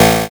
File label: zap